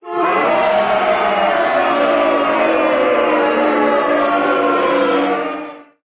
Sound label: Crowd Boo; Pitch Black Audio Pong